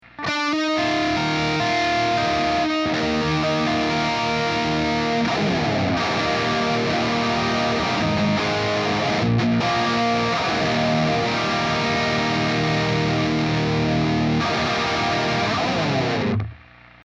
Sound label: solo lead od; Helixシリーズのマルチエフェクター